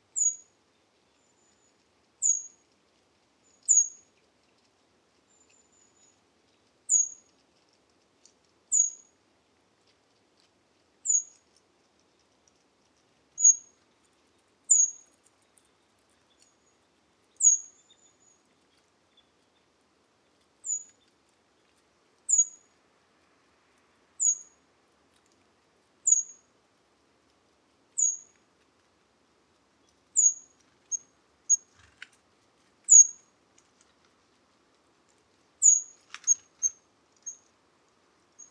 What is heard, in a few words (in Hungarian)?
hegyi fakusz hangja